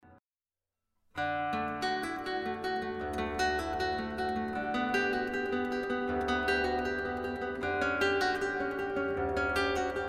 Classical Guitarist; Lansing

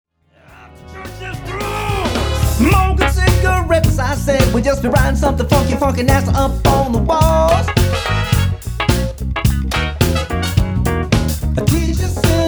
Party Band; Sacramento

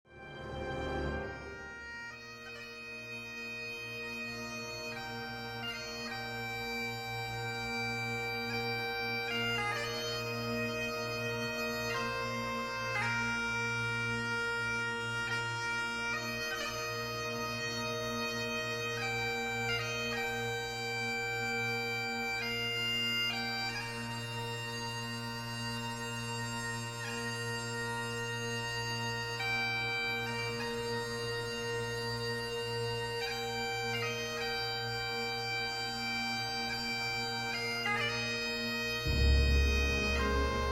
Bagpiper; Amazing Grace

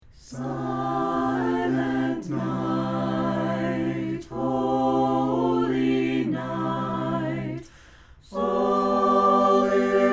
Carolers; Dallas

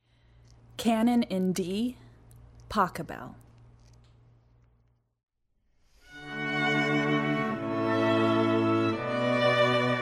String Quartet; Baton Rouge; Canon in D Pachebel

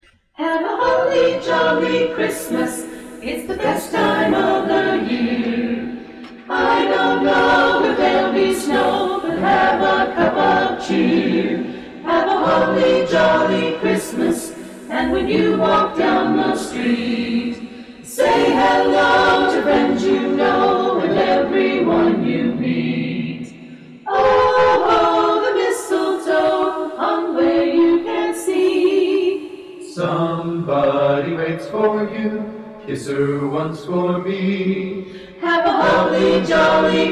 Carolers; Sacramento; Sacramento Carolers 1

